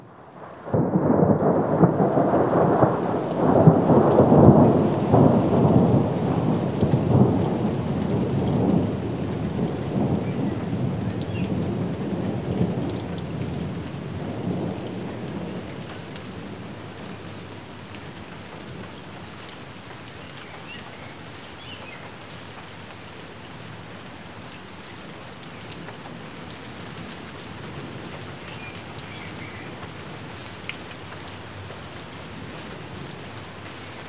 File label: rain thunder in woods; best service Blue Box